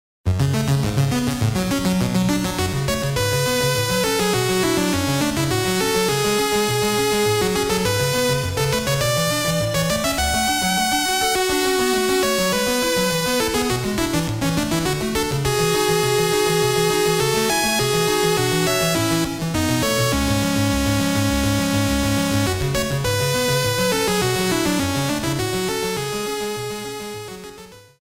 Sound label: Passpied